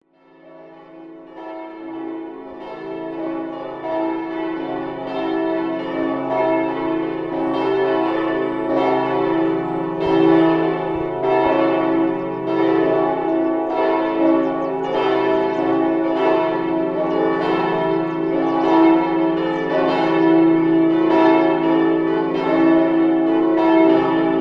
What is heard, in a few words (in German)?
Glocken